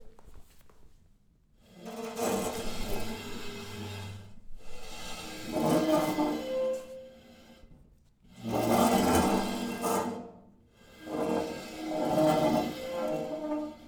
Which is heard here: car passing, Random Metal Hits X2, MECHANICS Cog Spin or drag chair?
drag chair